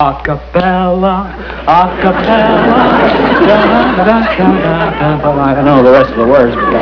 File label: accapella